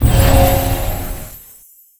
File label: reveal; SPELLS